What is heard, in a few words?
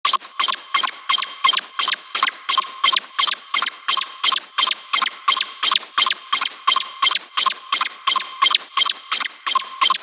liquid loop